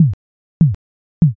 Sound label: slot stop